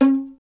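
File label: STR violin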